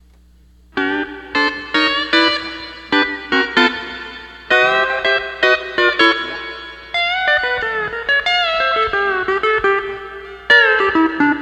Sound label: 12 String Lap